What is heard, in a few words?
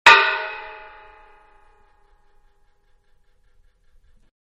Hammer Medium On Metal Tank; Hammers (Sound Effects:Tools); Manhattan Production Music